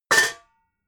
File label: pots hit hard short